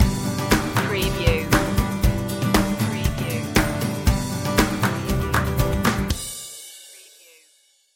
just smile stinger

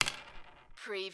drop pen on desk

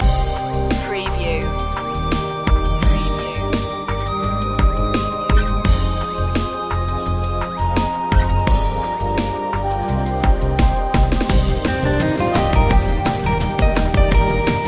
uk chillax loop

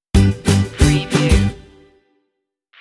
life stinger

